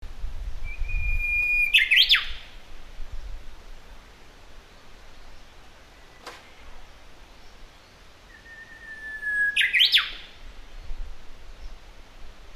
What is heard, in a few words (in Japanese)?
uguisu; ウグイス; ♪さえずり♪; 南房総の野鳥